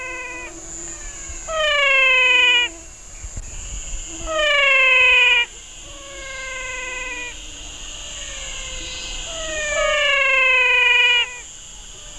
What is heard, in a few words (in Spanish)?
Ejemplo de sonido, Ranas y Sapos de Bolivia; Guía Sonora de las Ranas y Sapos de Bolivia; Fonoteca Zoológica